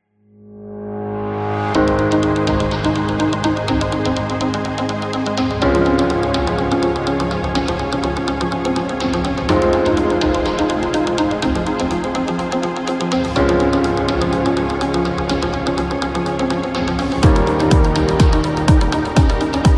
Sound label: Corporate Commercial